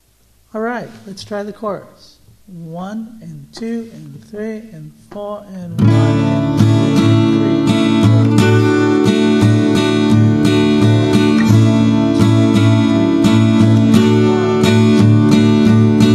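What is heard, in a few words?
open tuning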